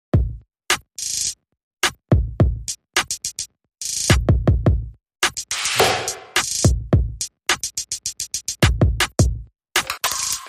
Drums Only

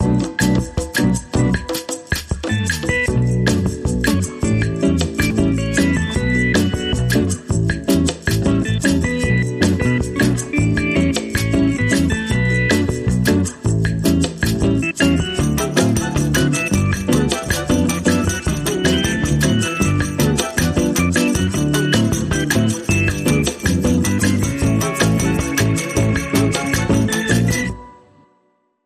Clean 30sec

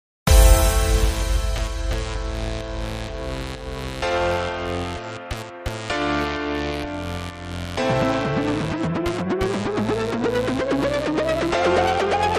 No Brass

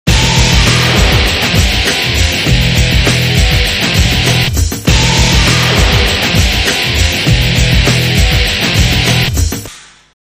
Scratch

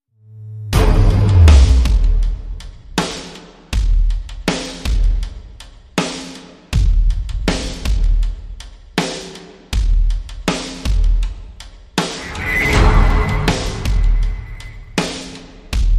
Drums and SFX

